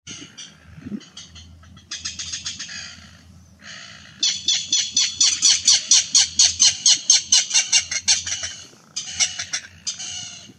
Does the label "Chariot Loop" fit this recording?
No